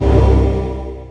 menu; Messiah; INTERFACE

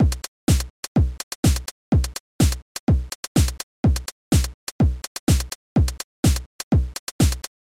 rhytm; amos

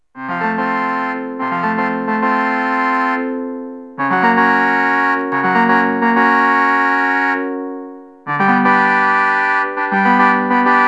Organ; Multimedia Audio Collection Deluxe

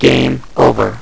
Game Over; Lab-Rat v2.0

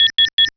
Racer Menu Command; Star Wars

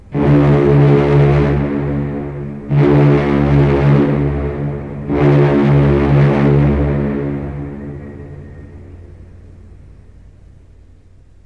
SHIP HRN; L'Effet Pommier - Volume 04

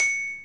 high bell; Paint 'n Play Pony; Crayola

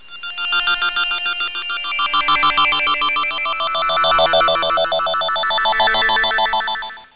Intro Sound; Centaurian

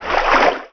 swim; SpecOps2 MPlayer Demo

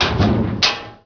kchunk, doors; Heretic II Demo

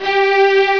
String (G3); 3D Ultra Pinball Demo

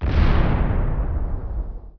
WDK Sm Unit Expl; The War Engine

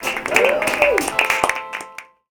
Applause; Cyberopoly; The Alfred University Edition

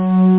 string; shockwave-string

